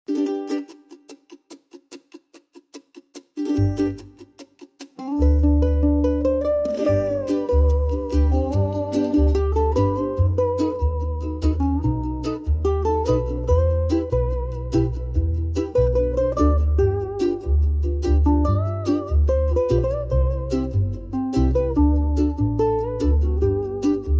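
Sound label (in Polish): Muzyka Hawajska; Mele Hawaii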